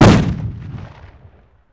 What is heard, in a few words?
Grenade Launcher Fire; 1944 Battle Of The Bulge